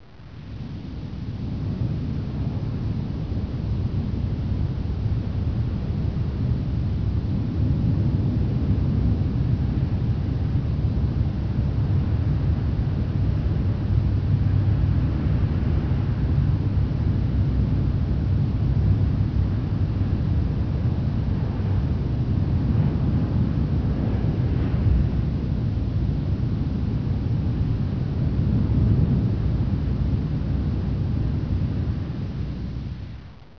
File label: Wind; legend of wolf windows 1997